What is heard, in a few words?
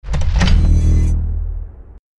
install corp; jinteki